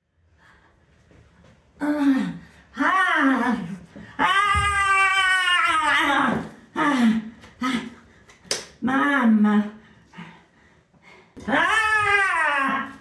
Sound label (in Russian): umalishennyi, zvuki sumasshedshego